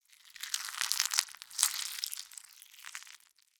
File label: animals bird hatch from egg